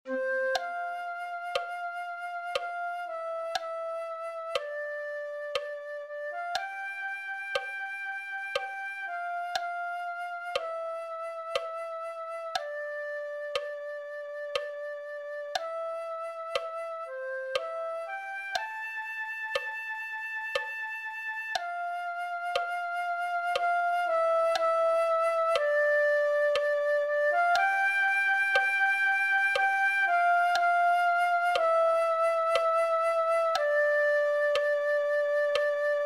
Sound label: flute 1